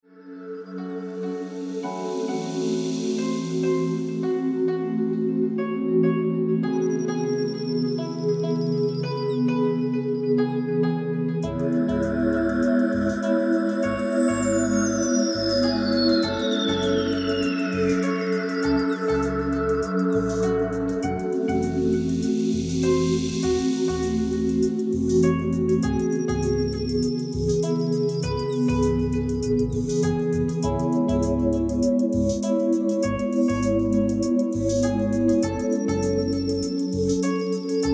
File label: Wellness Spa Music Relax